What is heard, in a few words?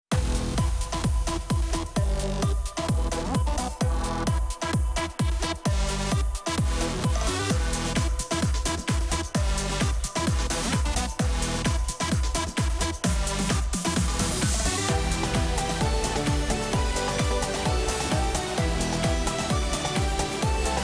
Battle Variation